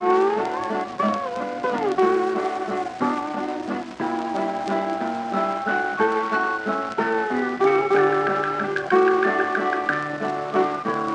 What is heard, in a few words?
Hawaiian waltz; edison